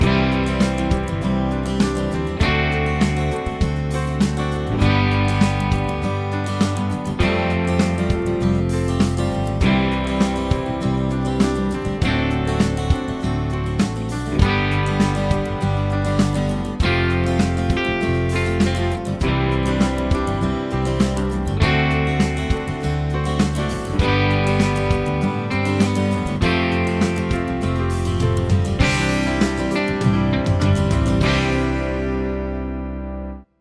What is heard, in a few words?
progs with applied chords